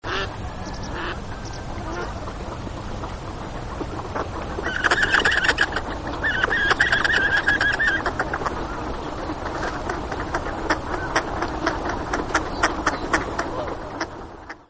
onagagamo

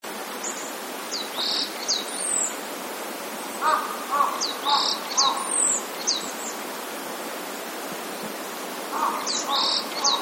yamaga

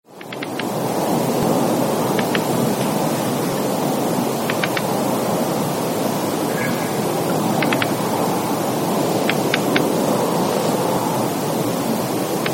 joubi

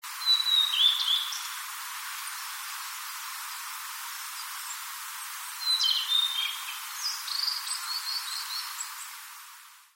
oruri

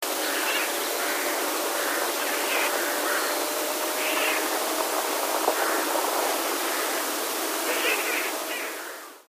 kokumaru

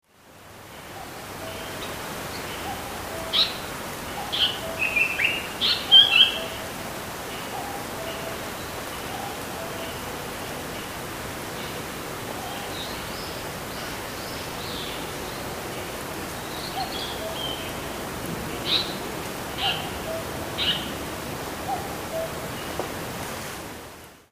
sanko

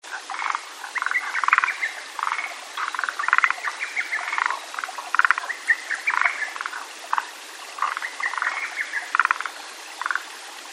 kaeru

